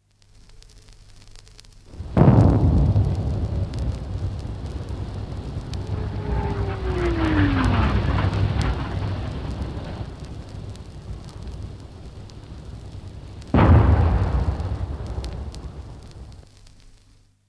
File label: A twenty-five pounder gun charge Shell passing over and expolding (from 78 disc); Cannon Sound Effects; BBC Historical